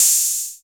HH 808, TR-808; 90 s Sample CDs; Sound & Vision - Gigapack I CD 1 (Roland); TR-808 & 909

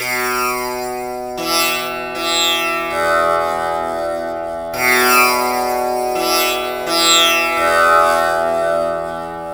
TAMPURA; Spectrasonics; Heart of Asia

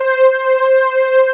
SMOOTH PADS, JV 1080; Pro-Rec - Synthsonic

